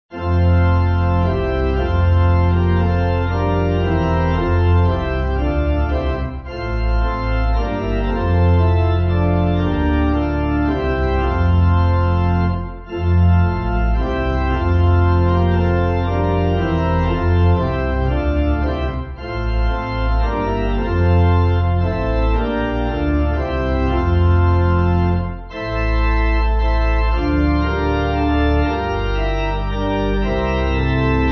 Hyfrydol Pipe; Alleluia Sing To Jesus

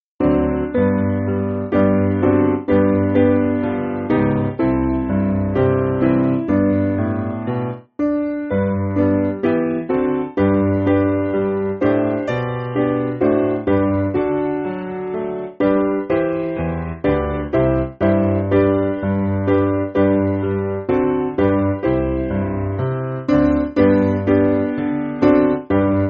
Piano Slower; The Lord Will Remember; Mair

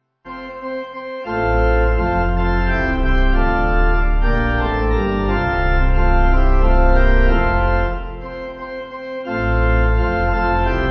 Pipe

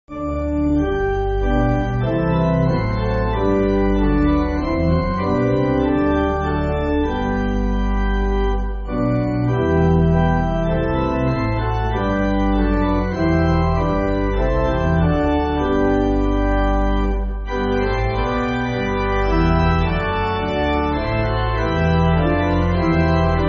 Pipe, church music

